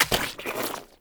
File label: Monster Pickup Impact; Warframe; Infested